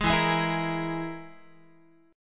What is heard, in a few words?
E Major Flat 5th, Guitar; strummed; JGuitar